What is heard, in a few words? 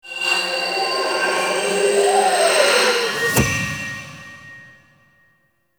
Wisp Twirl; Warframe; Duviri; Cinematics; Intro Execution; Courtyard